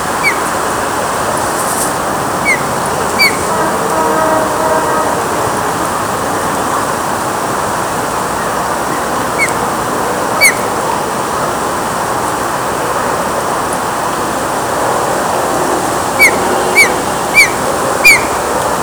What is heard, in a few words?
Elanus Leucurus; Aves; Accipitriformes; Accipitridae